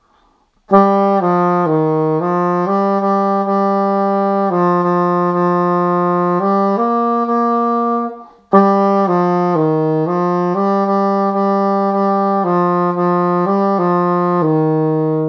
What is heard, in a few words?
Mary Had A Little Lamb, Saxophone